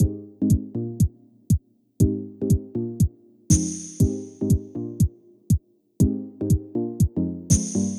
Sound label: Chill EDM; Loops; BB 2HTC Samples Vol 4 Addendum